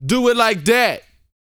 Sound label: VOCAL F; ILIO